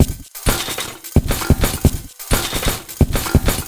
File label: Beat (130BPM), household rhythm; Loops